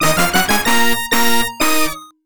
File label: Touchdown Stinger; NFL 95; Genesis